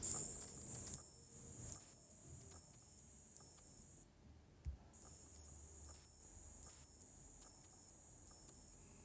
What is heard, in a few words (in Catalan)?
ratpenat de ferradura petit, sons ratpenats; ratpenats plafons